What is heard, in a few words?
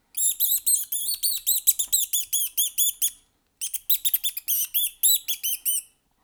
Lagurus lagurus